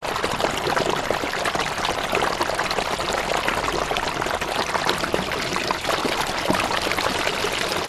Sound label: Running Water